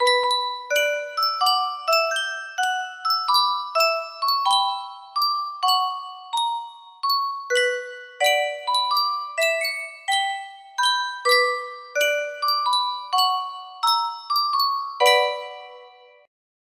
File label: Yunsheng Musikkboks, music box melody